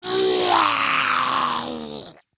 Attack Roar Grineer Ghoul; Grineer Ghoul Expired; Battle Barks

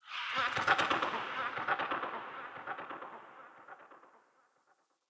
Night Creature Large Bird Medium; Night One Offs; Ambience

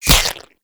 Ghost Expel Spirit Impact; Warframe; PriestQuest; RellGhost

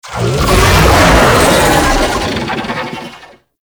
Infested Vocal Idle; Warframe

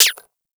Rifle Mech; Wisp

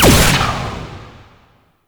Laser Explosion; Enemies; Weapons; Warframe; Tau; Sentient Dactolyst

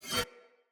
Dagger Whoosh Accent; Weapons; Swords; Fey

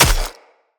Short Sword Medium Impact Flesh; Weapon Impacts; Player; Swords; Combat

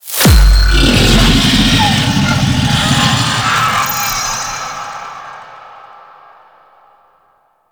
Sentient Oplink Murex Disruption; Squad Link

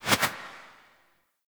UI Collectible Tarot Card Click Flip; Warframe

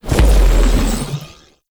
Bullet Orb Fire; Enemies; Abilities; Warframe; Zariman; Void Angel

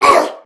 Pain Crewmen; Warframe; CorpusCrewmen; CrewmanB

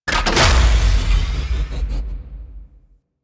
Space Port Large Door Open; Warframe; Venus Open World; Objective Rooms

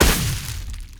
Updated Shot, weapons; Warframe; Moa Pet; Cryotra

